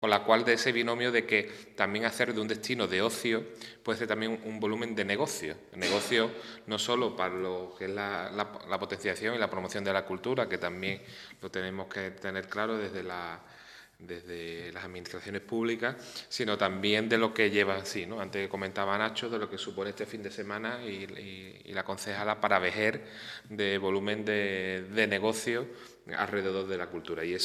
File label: jazz; Jazz Vejer